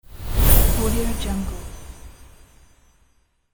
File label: shining whoosh